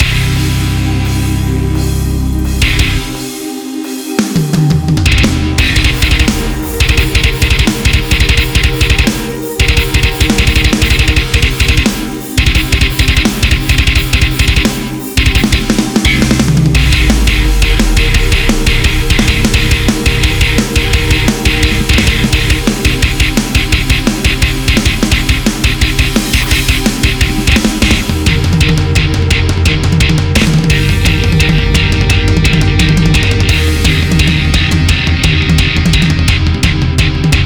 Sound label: Silent Metal